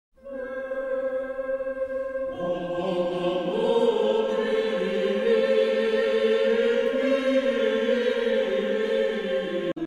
Hymn

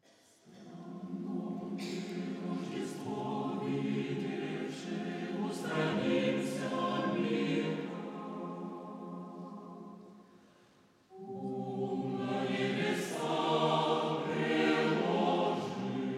Kameralny Chór; STRANNOJE ROŹDŻESTWO WIDIEWSZE; Gieorgij Swiridow; Polifonia; Szaulaj; Litwa

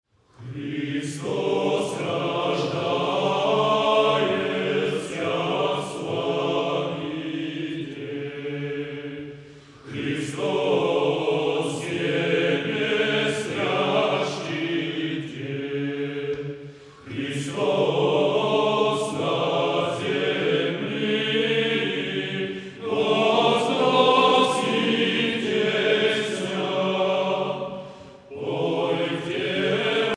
Christos Rażdajetsia Kanon Rożdżestwa, Akademicki Chór; Bel Canto; Olsztyn (Polska)

